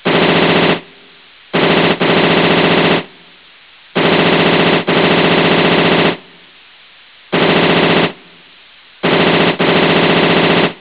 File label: machine gun